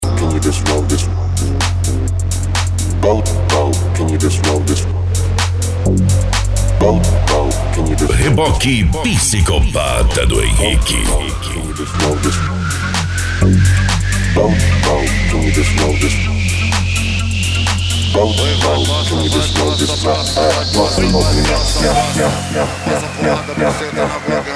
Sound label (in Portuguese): eletro funk